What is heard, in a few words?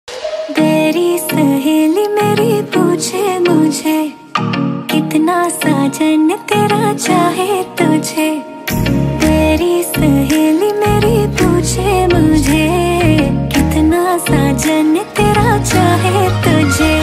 mehendi song ringtone